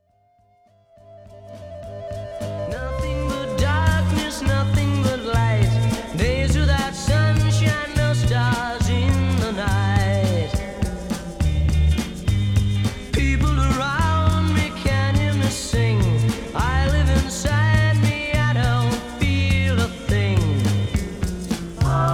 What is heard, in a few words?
I Travel Along Vocal